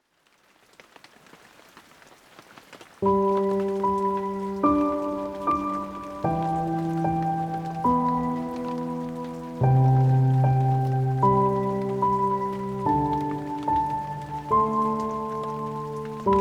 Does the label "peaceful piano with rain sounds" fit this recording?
Yes